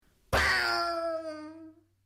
ドラム缶

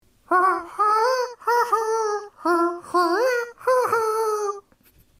キジバトの鳴き声

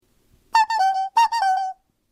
ハト時計

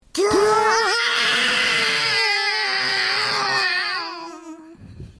ホラーのタイトル

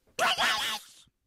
カメラのシャッター音

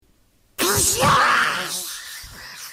水に飛び込む

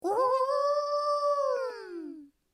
オオカミの遠吠え

